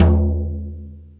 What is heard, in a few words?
Jazz Drums, E-MU Pro-Cussion; drum machine